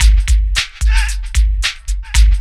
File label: LOOP, DRUM; E-Lab; X Static Goldmine 2